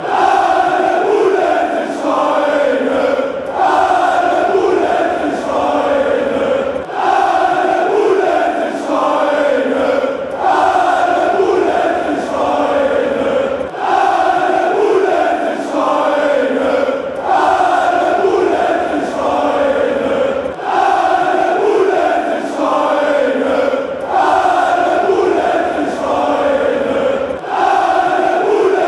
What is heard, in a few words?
chant; schalke